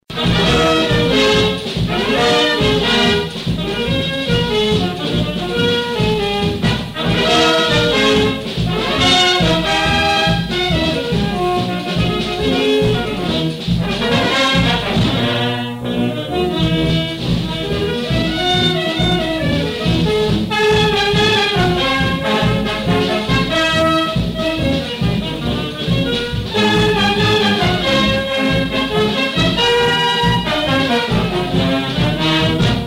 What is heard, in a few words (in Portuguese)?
Frevo